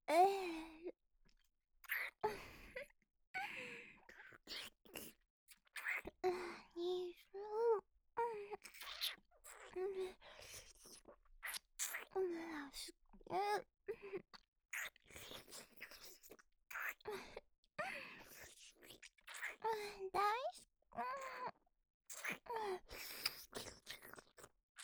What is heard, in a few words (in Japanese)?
ディープキス 好き好き